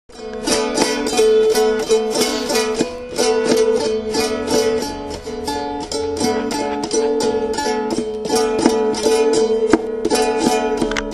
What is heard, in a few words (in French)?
guitare